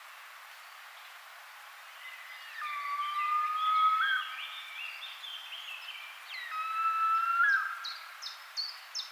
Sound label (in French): circaete jean le blanc